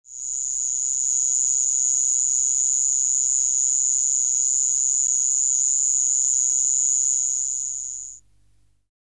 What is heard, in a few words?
Gryllotalpa vinae